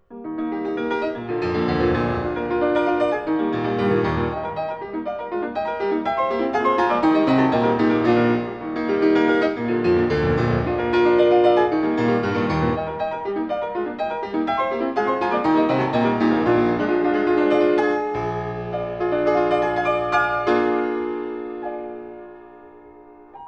Variations and fugue for piano in E flat major; "Prometheus"; "Eroica"; Beethoven

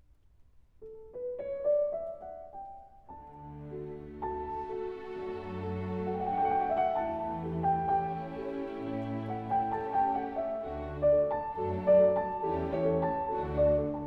Rondo for piano & orchestra in D major Adagio; Mozart; The Piano Concertos

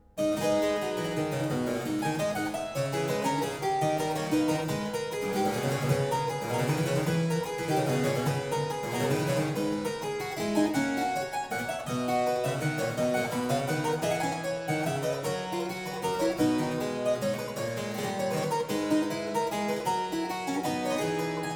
Courante; German Chamber Music before Bach

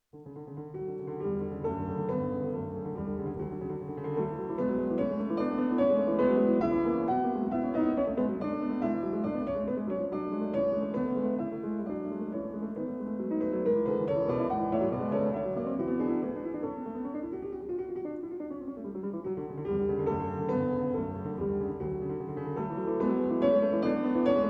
Songs without Words for piano in D major; Book 2, Op. 30: No. 5; Mendelssohn

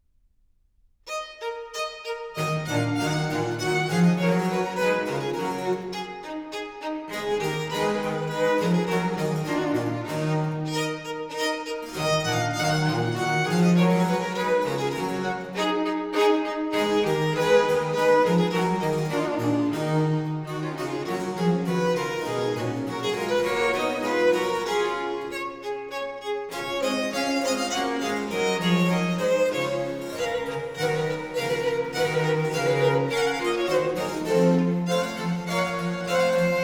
Sonata in E minor, Gavotte. Allegro, Trio Sonatas; Handel

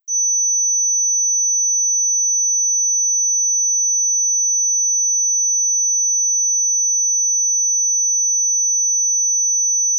6.3kHz

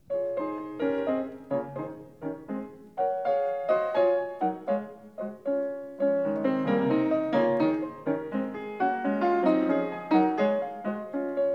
Piano Sonata in C Sharp Minor, Allegretto

